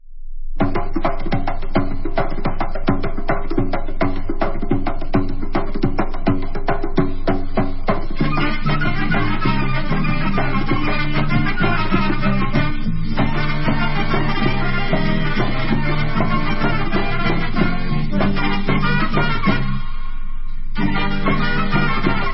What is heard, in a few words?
debka, instrumental